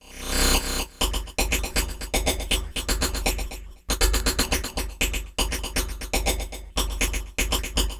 Filter Percussion Loop; Electronic Point Blank

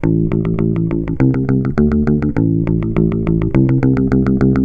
bass, Roots Funk; USB Soundscan vol.41; AKAI

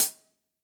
Acousti Hat Hit, hihat; Ultimate hihat samples

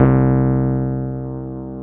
C1, Keyboards Old School; USB Soundscan vol.09; AKAI

